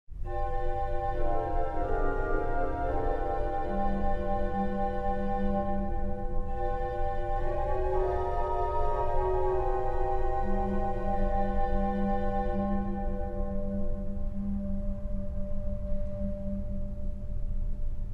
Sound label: melody